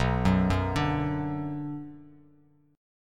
B Minor B Bass Standard; arpeggiated; JGuitar

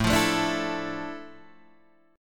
F Major 13th A, guitar chord

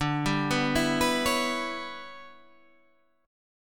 E Minor 13th D

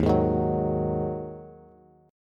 D sharp Minor Double Flat 5th, Bass; strummed; JGuitar

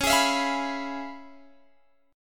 Db 7th Sharp 9th Db down Guitar Open G

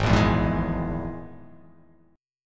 G sharp Suspended 2nd Sharp 5th, chord; 4,1,2,1,4,3,x,x

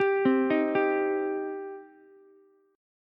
G Suspended 4th Sharp 5th, Ukulele; arpeggiated; JGuitar

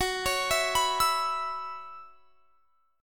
Gb 7th Suspended 4th Gb down, chord; Gb7sus4 arpeggiated

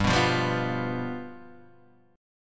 F Suspended 2nd Flat 5th G

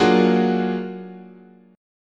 Gb Augmented Major 9th, chord

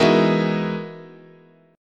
E Major Sharp 11th, guitar chord

